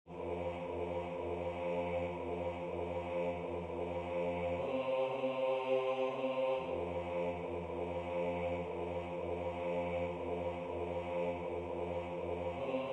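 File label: Bass, Religious Folk Songs Of the Negro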